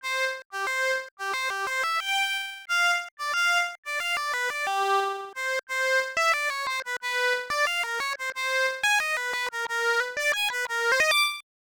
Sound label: Violin; Doukyusei; IBM PC